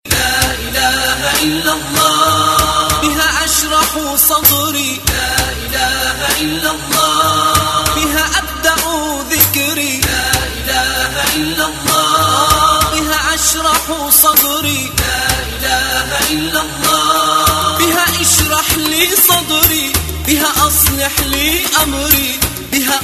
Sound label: La ilaha ila Alah